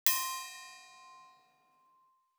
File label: ambient